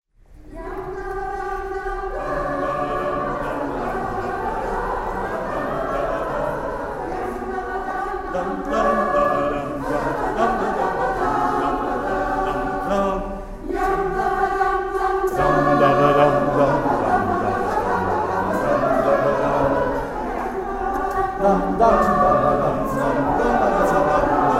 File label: Fanfare A Capella; Rosmalen